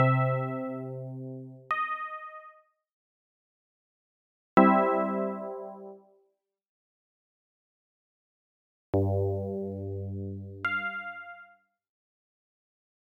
RAGTIME

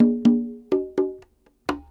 PERC LOOP ETHNO 125

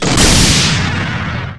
MISSILE; Shadow

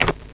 Sound Door Nob; Alice - An Interactive Museum

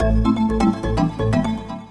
SYNTH HOUSE 125 A, Keys; eJay

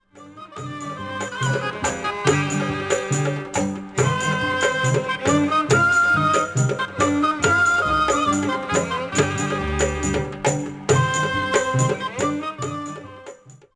ARAB MUSI

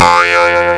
Boing!; Catch Seven

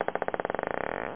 Ping Pong

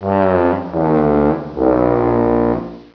Tuba

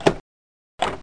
DOOR OPEN; DAoC; Toa

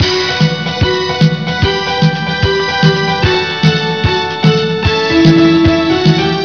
tune; Design It! 3D

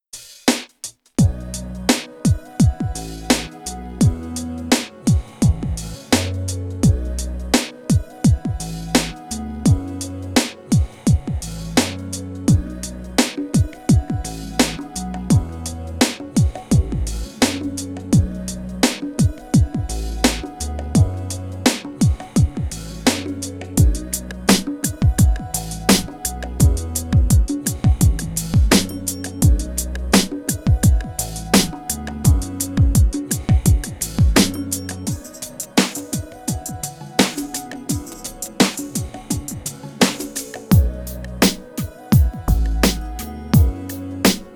Strictly RnB; E-Lab; e-LAB MP3 Demos

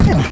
bump, cyborg; QUAKE2

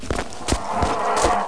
bear; My Animated Word Book; zoo

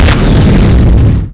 Hit Big; Big bang

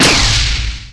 laser; ast miner

